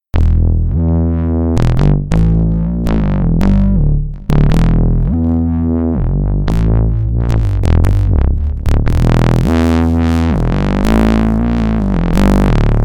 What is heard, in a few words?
Brezel Bass